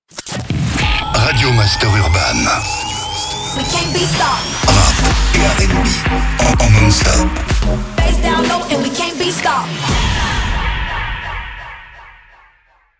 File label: JINGLE RADIO; RADIO MASTER URBAN; Habillage